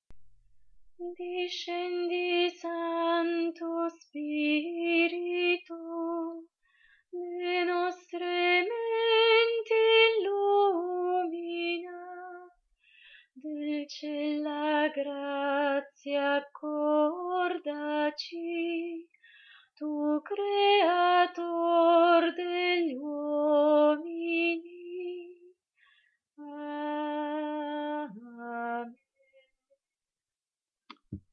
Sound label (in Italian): contralti